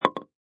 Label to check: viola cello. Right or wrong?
Wrong